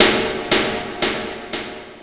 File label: Echo Snare; Sonic Foundry (Sony Creative Software) - Futurist Drum & Bass; Drums & Percussion